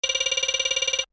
Digital interface scrolling menu ui

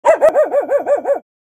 Scared dog bark

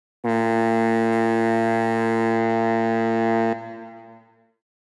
Ship horn long and low blast; boat

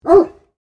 Large dog barking single bark

